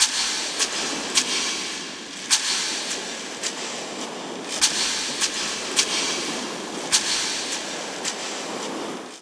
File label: 104 ShakerAtmos